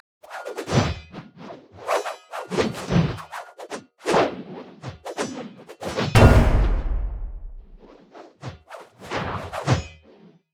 emote dance; Xin Zhao

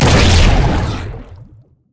elastic slingshot; LoL; zac base

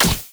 human basic attack; Elise

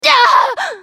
dying; Akali

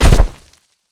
pounce land; nidalee base

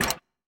ui hud lock camera click; LoL

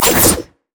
rampage; LoL; hecarim

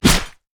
pirate basic attack; LoL; gangplank

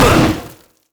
unstoppable force hit; LoL; malphite

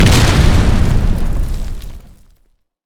gangplank cannon; LoL; special forces gangplank

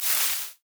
brush on movements

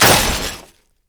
land; jinx base

